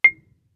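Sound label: Marimba deadstroke fortissimo C2; MIS Pitches - 2014; Percussion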